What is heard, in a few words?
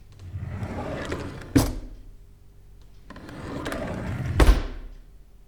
Cabin